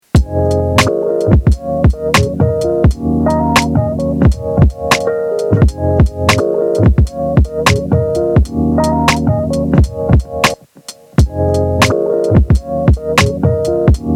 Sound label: BONUS BEAT